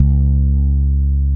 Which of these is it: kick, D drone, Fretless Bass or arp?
Fretless Bass